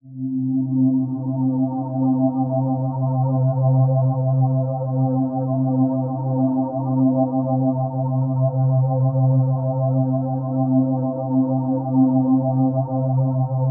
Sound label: PAD Lurking - C, Synth One Shots